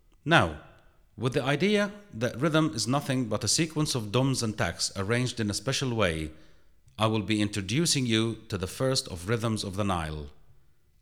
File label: The Rhythms, Egyptian Dance Rhythms